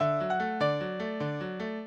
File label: minuet, mozart